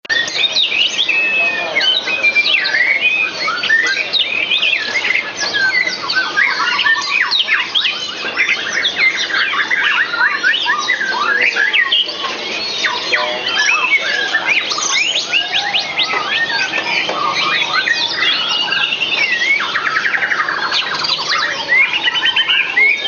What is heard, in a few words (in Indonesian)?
simulasi lomba untuk murai batu